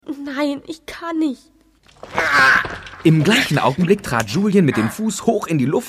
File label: aargh